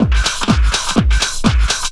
drm 125, drumloops distortmix; house; PS-28 Vocal House Samples

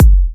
Bassdrum; Vengeance Samples

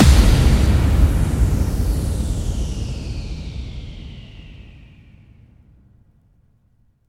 Bassdrums FX, FX Kicks; Vengeance Samples

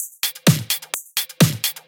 House loops 128BPM; Vengeance Samples

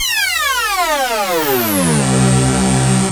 FX Downlifter

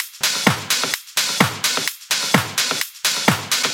House Loop, 128 BPM; Vengeance Samples

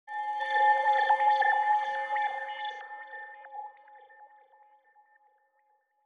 Pad; Dubstep Sample Pack

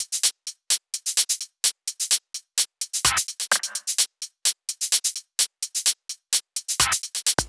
128BPM Drums; Vengeance Samples; Beatdancer Kit

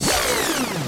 Vinyl FX and Scratches, FX Scratch

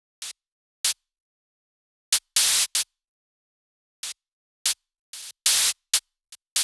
126BPM Kit; Netherworld Kit; Vengeance Samples

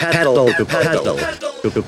House Loops, Vocal Loops House 128BPM

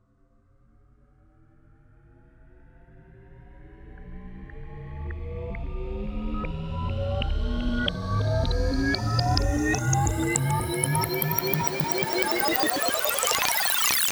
Sweeps Long

